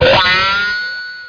SPIN; JOHNNY

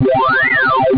teleport; marathon

